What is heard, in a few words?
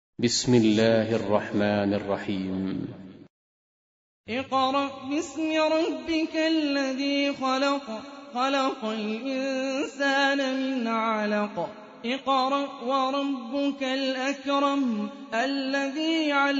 Quran; Hani Refaey